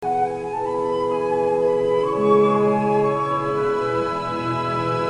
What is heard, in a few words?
Game Tune